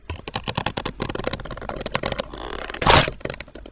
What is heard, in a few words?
shoe burst